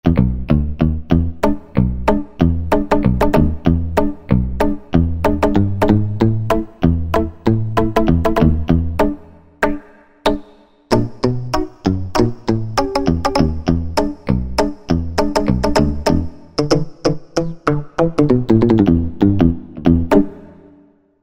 Thick Pick

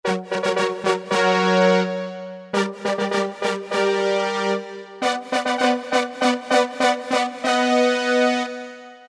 Fanfare, Roland PN-D50-01

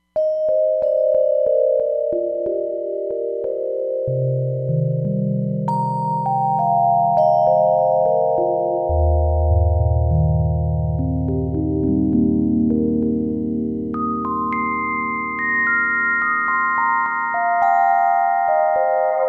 Bell Monitor, Roland Fantom XR

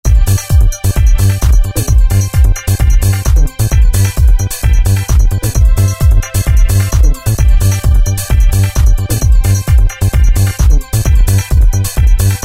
Techno Pattern, Roland MC-303

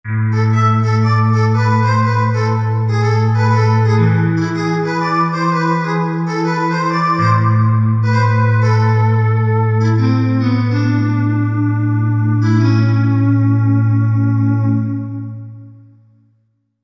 2 voices; Korg MSC-12S Synth 3

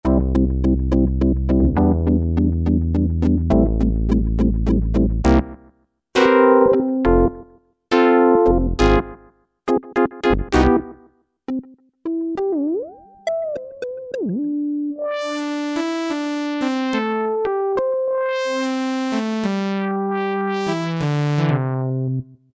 Poly Comb, Roland V-Synth; Factory Patches

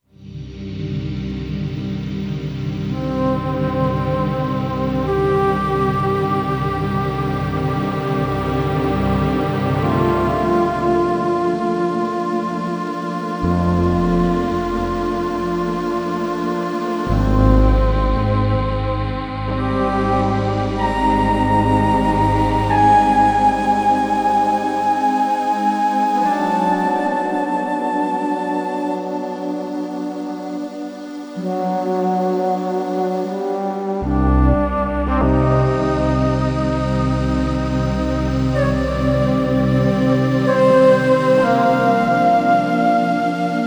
Ethereal Split, Kurzweil K2500X

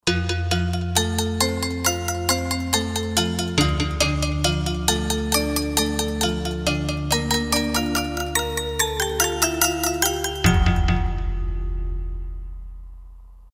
Metal Gamelan, Roland JD-990; Factory Patches

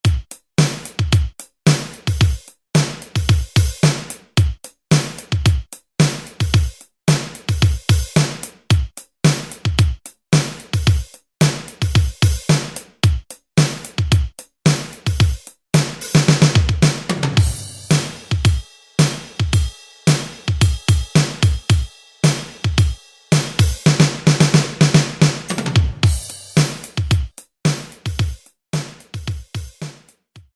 R&B, Alesis SR-16; Preset Patterns

